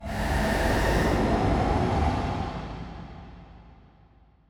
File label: nuked planet; ambient; Stellaris